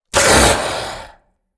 Zomby Stressed; Blind Survivor

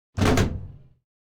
gun tank; NCH Library

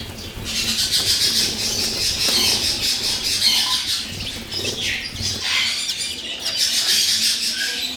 birds farm panic; best service Blue Box

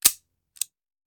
Hand Gun Foley; Sound Ideas; Guns

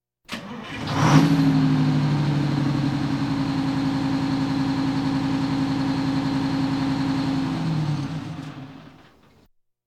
Police, Fire engines

